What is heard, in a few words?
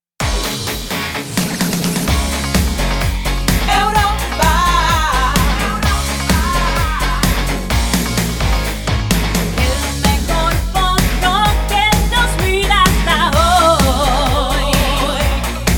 pop